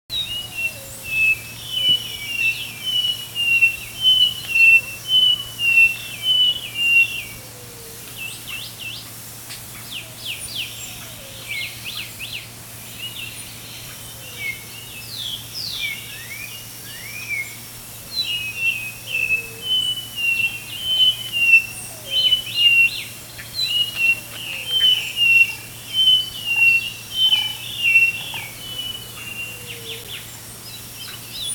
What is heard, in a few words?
White eyed Thrush call; Robert L. Sutton